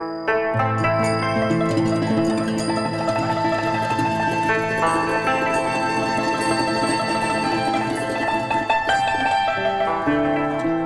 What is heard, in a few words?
TRADITIONAL Chinese, Picking Flowers